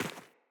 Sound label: Sandals Run Rock, Footsteps